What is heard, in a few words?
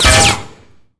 tank hit; War Thunder; sfx tanks